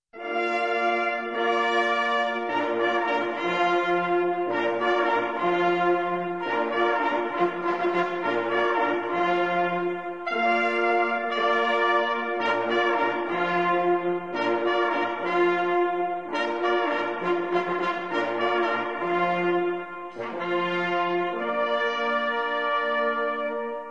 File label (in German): hirschtot, signale